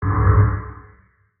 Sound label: gravity magic small; 魔法効果音